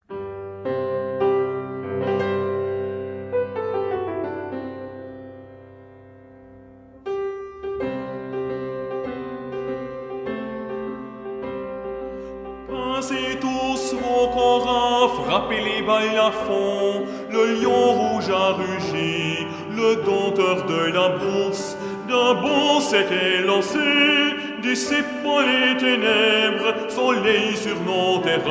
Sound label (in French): Hymne national du Senegal; les diambars